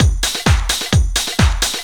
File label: 130 tribal, LOOP PERC TRIBAL; DRUMLOOPS; Ueberschall - Techno Trance Essentials